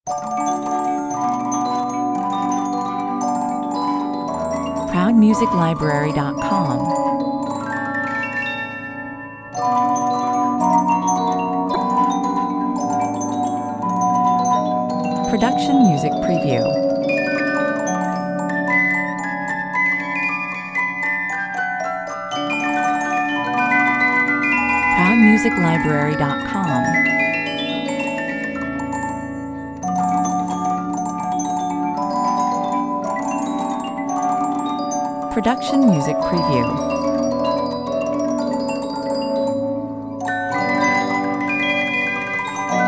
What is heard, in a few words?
trilling music box, 1880 Paillard Expressive Music Box